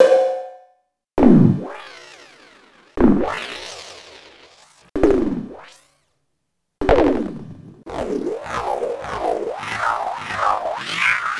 Bongos and Pings

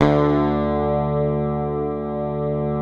PICKED, Bass, D2; Sampleheads; Will Lee Bass Library

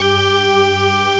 ORG G, ELIXIA ORGAN; Best Service ProSamples vol.10; House; AKAI

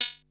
hh fx cl, Yamaha Motif ES; 300 Drum Machines; Yamaha Motif ES-Series

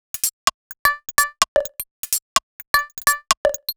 127bpm, House Drumloops

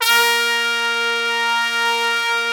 A#2, Section Horns

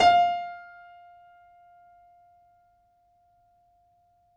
FF F 6, Grand Piano off mic; AKAI S6000 CD-ROM - Volume 2

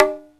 CONGA SET; OMI - Universe of Sounds

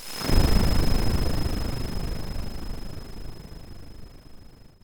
warble, Korg Electribe ER-1; Kit 21

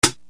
RX7 HH PDL, Yamaha RX-7

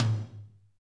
TOM TOM

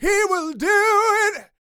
Vocal Gospel; Vocal Planet Vol1 Gospel

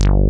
SYN BASS; Pro-Rec - Synthsonic

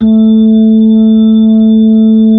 B-3, Brite; Keyboards of The 60's and 70's

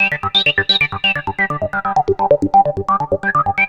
Analoop, Industrial Analog

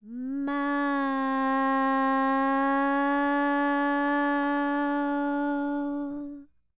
World Voices, INDIA; ILIO; Vocal Planet

